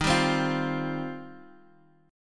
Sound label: F 7th Suspended 2nd D sharp